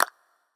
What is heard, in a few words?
gambler mouse click; Beauty Fruity; wazdan; ultrafast